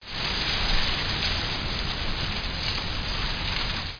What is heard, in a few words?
granary; CAESAR3